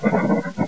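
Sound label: scuttle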